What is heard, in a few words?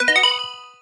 Stylish Move